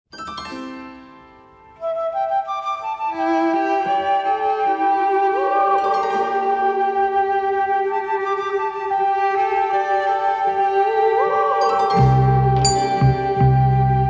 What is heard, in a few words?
Sitar